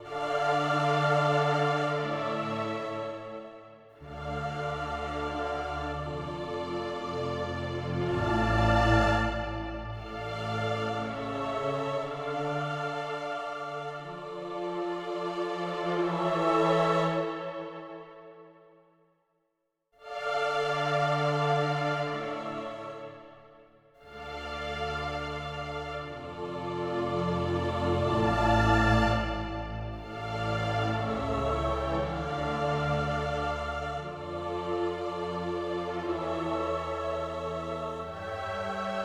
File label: Sad High Theme